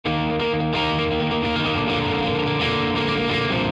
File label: happy elektro intro